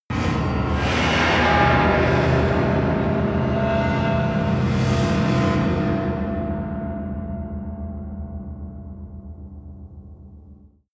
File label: whoosh scifi ring